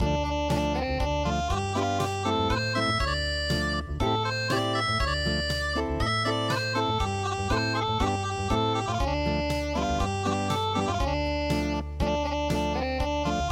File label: unpluged, midi